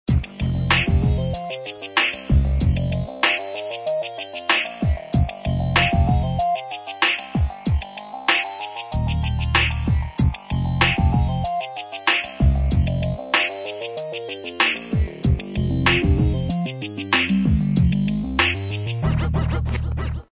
Play Music; Blox Forever

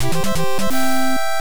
Item Acquired; Pocket Zaurus Ju Ouken no Nazo; Family Computer

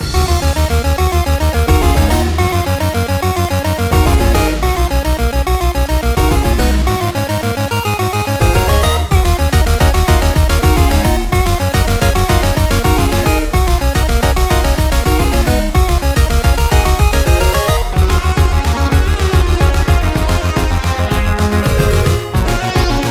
Battle; SanctuaryRPG

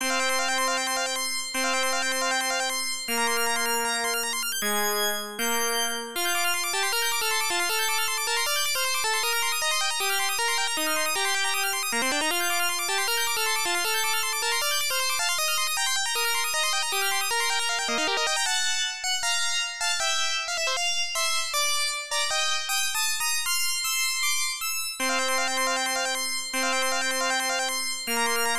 Unused BGM

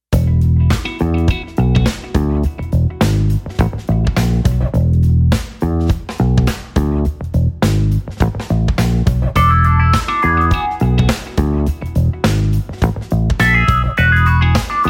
Gambler's Groove

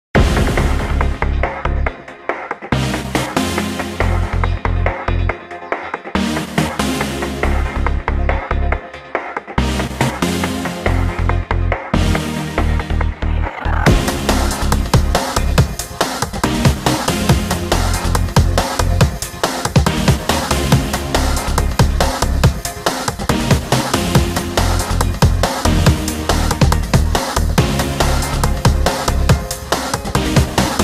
Epic Song; Washed Up Wizard

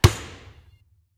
Special Basketball Bounce; Sfx - Special Basketball Bounce 01

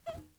glass jump

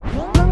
mus char rad spinup; OK K.O. Lets Play Heroes

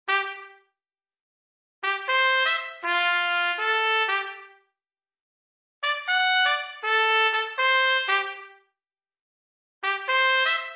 Trumpet

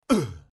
Hit; Russian Life Simulator

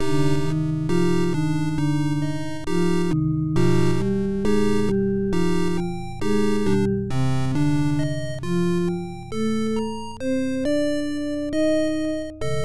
Going Underwater; Eye of the Beholder III Assault on Myth Drannor; PC-9801; OPN

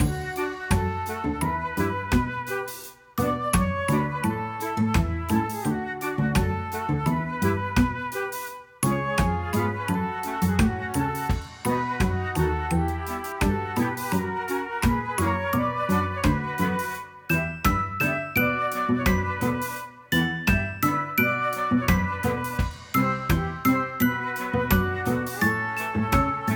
Casual Game Music; Xanchuchamel

